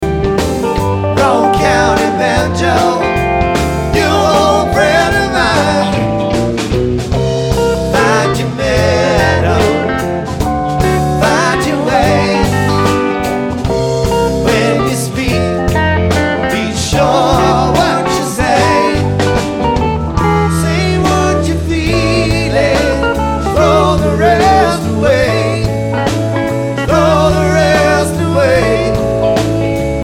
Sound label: Banjo